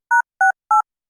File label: Vol DOWN; 最大出力を上げ下げできますが、基本的には使いません。; 補聴器; MPO抑制; AMP補聴器調整用